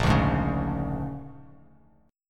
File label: Bb 7th Sharp 9th Bb, Bass Standard; Bb7#9; strummed; JGuitar